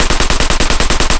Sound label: loop l8a1 origin; guns tanks; War Thunder